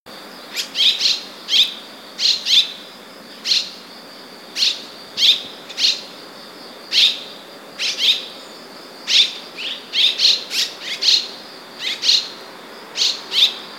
Rostgump

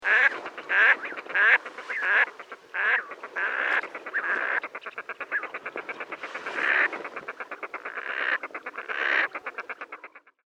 Tofsand

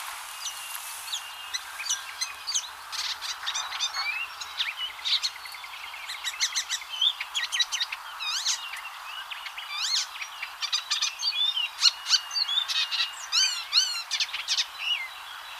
Härmsångare

